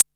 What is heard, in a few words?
DR-660 Perc, Drums, Boss DR-660